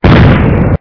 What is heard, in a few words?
boom; Brazil; crash